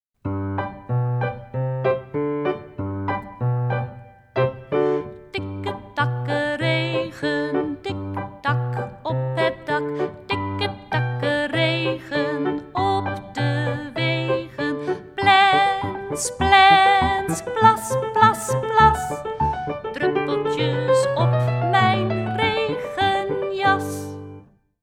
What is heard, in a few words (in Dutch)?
Tikke takke regen gezongen